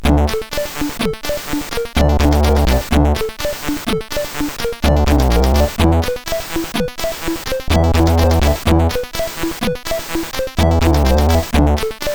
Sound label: Orcus dark lord, CSG8580R5; hvsc